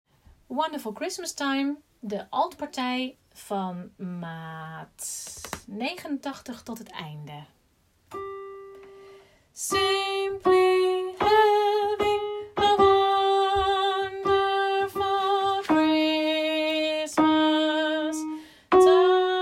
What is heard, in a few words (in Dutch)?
alten; kerst